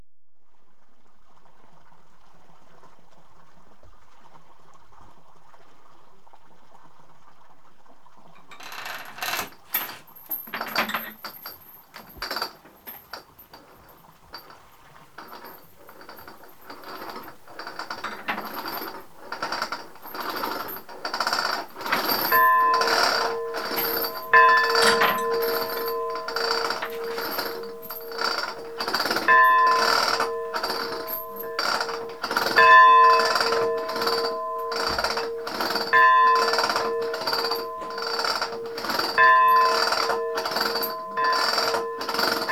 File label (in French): four a pain chainecloche avec fontaine; oultet